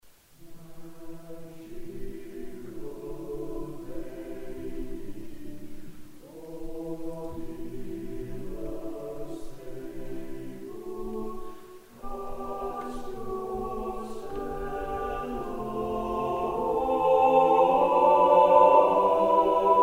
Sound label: SATB; Dancing Stream